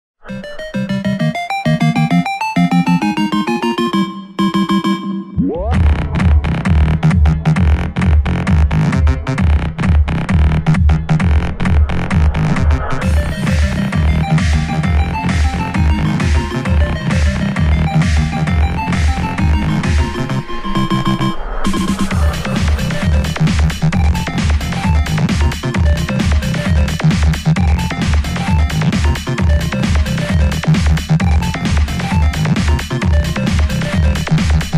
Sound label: GAME RINGTONE, INSTRUMENTAL; BATTLE CITY